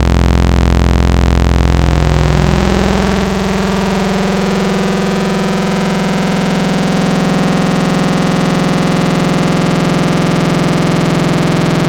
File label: Growl We Wow; Synthetic's Serum Collection; Echo Sound Works Core Tables